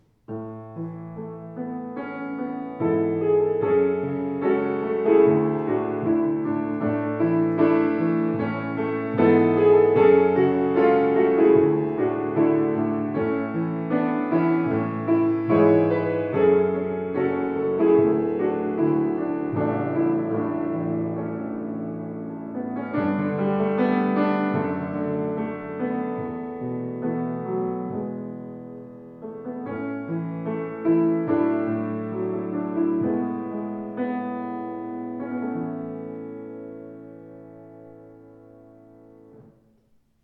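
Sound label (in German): Yamaha C3, Flügel; 186cm c3 fluegel 2003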